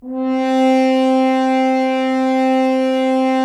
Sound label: Solo F.Horn, Roland LA Composer; Roland - LA Composer vol. 4